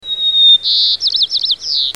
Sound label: Zonotrichia leucophrys; Chordata; LandBirds